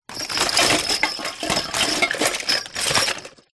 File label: leisure toys rummage box